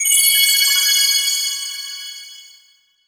magic

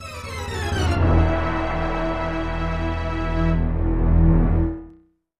Dying; super star wars

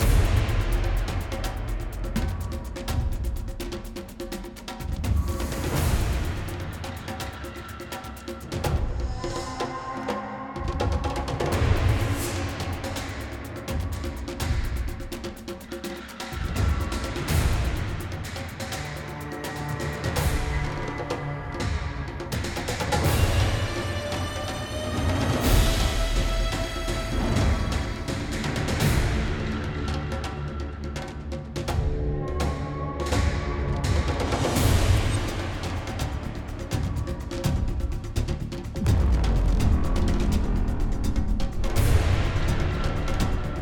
Battle Throne Room

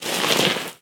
Dirt; Prison Architect; Order

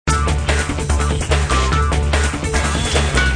Loop; Chaos Faction

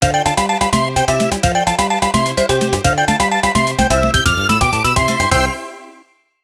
Dance (Long) After Boss; Kirby's Return to Dream Land Deluxe

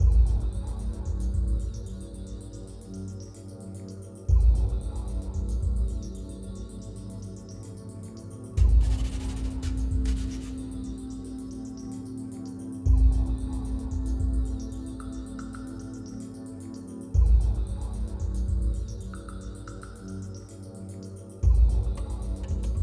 Saturn ambience

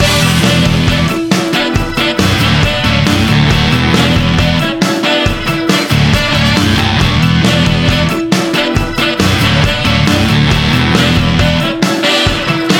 MusicRoom, reveal music

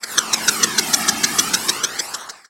Dunk Sound; Star Dunk Sound

